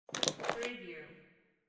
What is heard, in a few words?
handbag lock